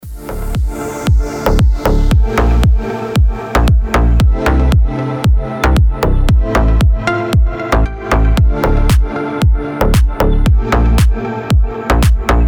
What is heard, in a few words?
deep house original mix